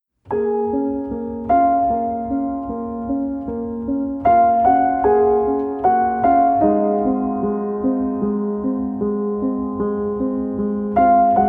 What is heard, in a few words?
peaceful lullaby